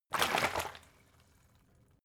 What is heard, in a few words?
bottle water big upend; berufe